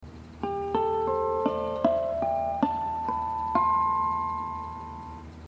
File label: hawaiian piece